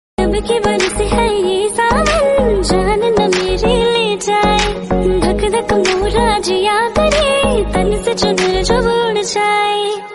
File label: Female Version, ringtone